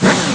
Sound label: motor; ST-XX Amiga Soundtracker Sample Packs